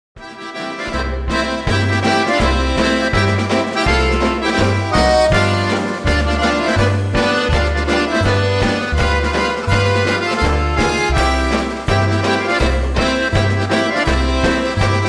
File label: Schottische